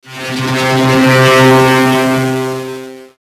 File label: Sweeper Digital Swell Distortion; Production Element Imaging Element Accent Transition